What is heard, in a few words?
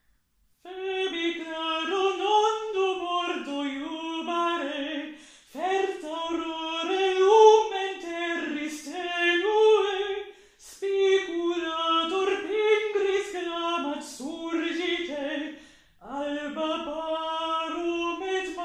solo